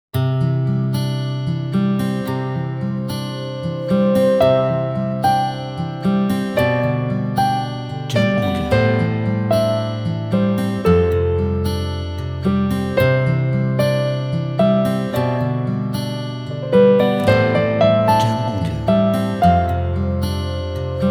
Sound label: Acoustic Guitar Romantic